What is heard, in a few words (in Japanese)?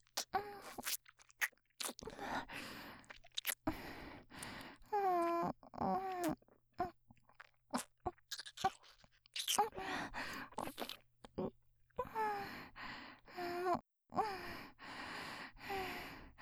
口を離さずキス 臨場集中